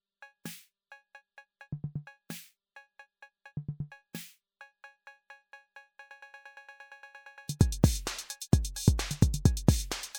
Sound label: DBI Touchstrip Steplooper